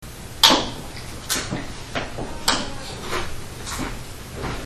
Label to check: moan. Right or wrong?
Right